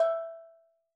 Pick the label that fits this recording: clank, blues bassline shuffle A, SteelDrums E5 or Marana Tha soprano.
SteelDrums E5